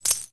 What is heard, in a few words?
Pickup Gold